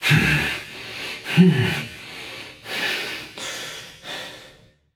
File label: breath, voicepack; smite; jorm